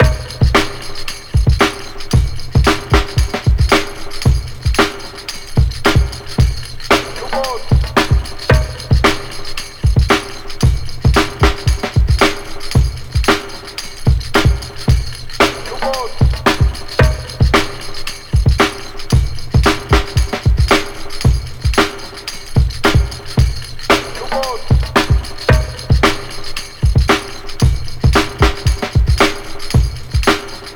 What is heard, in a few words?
113 C'mon Tamb, breaks; Lockdown Breaks